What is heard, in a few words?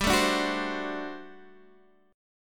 G Major 7th Suspended 2nd Suspended 4th G down Guitar Standard; GM7sus2sus4 strummed